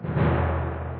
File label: Tymp